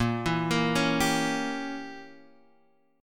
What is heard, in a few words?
C sharp Diminished 7th A sharp